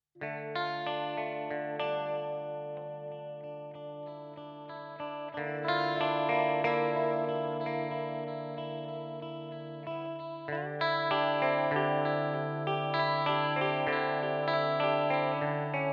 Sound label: Modulated Reverb Neunaber Immerse Reverberator MK II